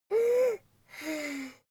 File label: child breathe deep